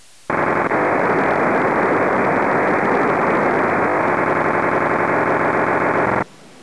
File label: astro imbe, DSD decoder